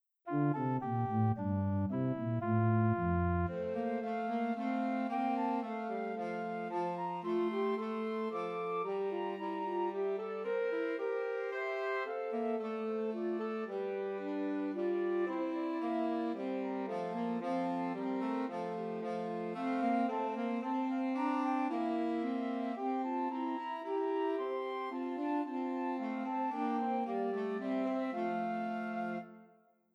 Away in a Manger, Christmas Trios for Flute Alto Sax and Tenor Sax